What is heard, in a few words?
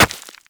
Operator Run Rock, Footsteps; Warframe